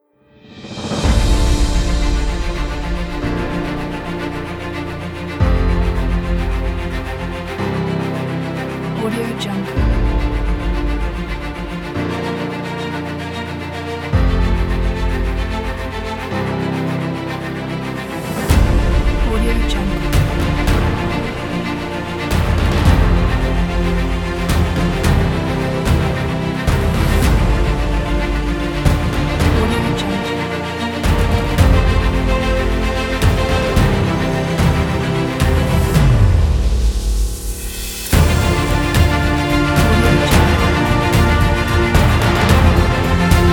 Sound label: epic cinematic background